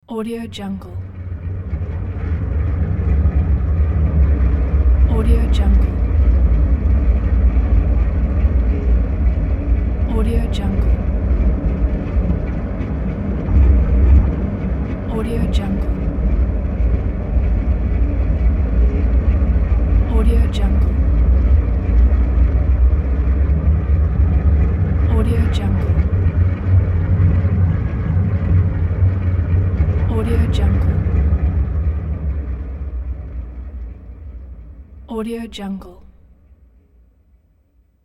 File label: vortex wet rumble with panning; hollywoodedge